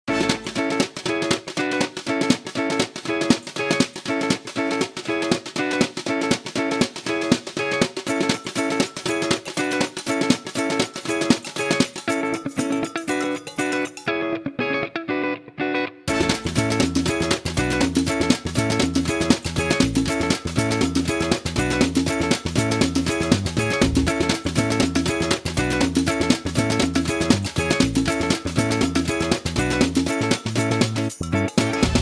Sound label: music